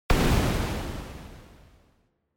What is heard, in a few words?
Gunshot